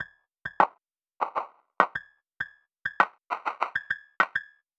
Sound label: Drum Effect Bit Crush Filter